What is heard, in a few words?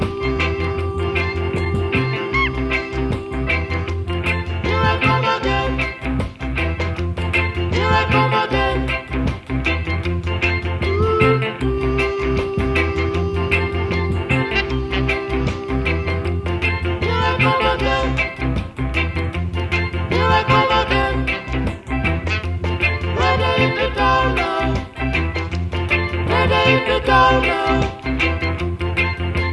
reggae